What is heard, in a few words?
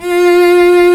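strings solo, cello orchestra; String Master Series; Combos 1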